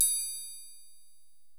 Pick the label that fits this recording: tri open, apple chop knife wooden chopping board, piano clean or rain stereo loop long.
tri open